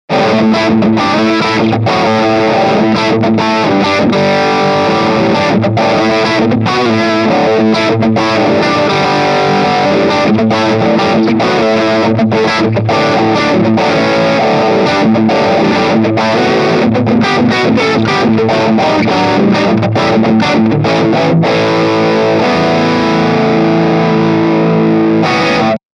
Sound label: Medium Dist Riff; ISF rechts